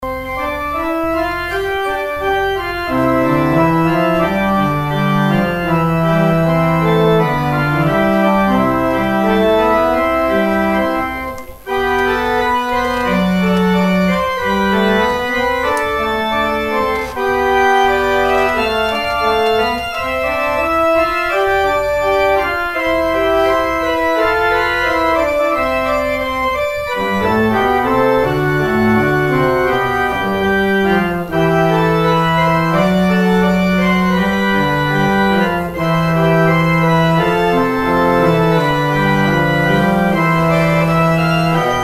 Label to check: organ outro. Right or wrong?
Right